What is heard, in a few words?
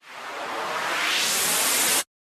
Anime Super Charge Up